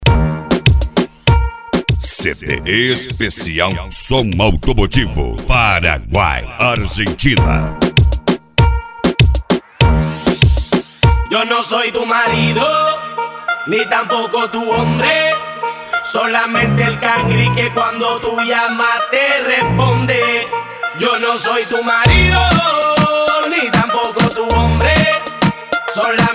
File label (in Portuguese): som automotivo